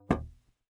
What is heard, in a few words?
noise muted strum, swag bass